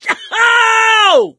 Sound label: scream while pounced, survivor; source engine; left 4 dead 2